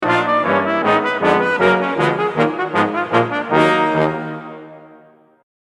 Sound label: Waltz; Brass